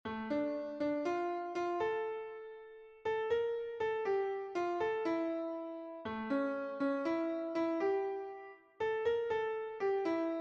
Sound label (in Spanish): soprano, himnos en piano; cantos del camino